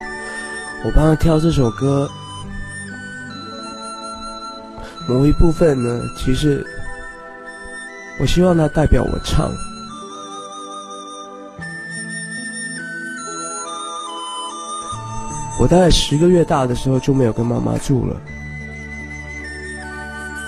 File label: chinese sad